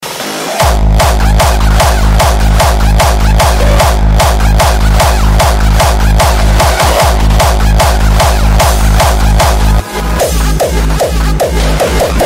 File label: Hardstyle Ringtone